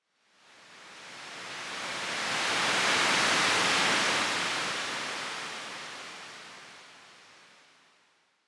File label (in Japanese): 海の音